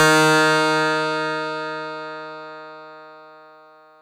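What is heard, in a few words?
Fuzz Clav DX100 D#0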